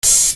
Hat, EuroDance; Best Service; Ethnic Journey Sound Cube; EuroDance Essentials

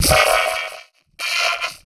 VOICE FX, Dance Vocals

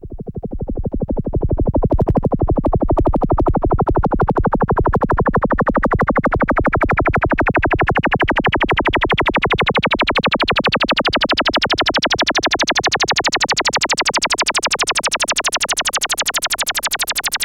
KOPTER, SYNTH FX; AMG; Global Trance Mission VOL-2

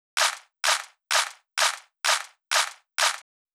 Drum Loop 128BPM Clap; VEC5 Drumloops; VEC5 Multi Loops 128BPM; Loop Elements

